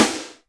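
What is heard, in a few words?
GATE SNARE; Crypton - DrumBase5000; GATE KITS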